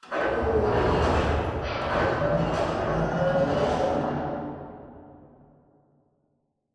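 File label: Robot Arm Receive Distant; Boomtown; Titanfall 2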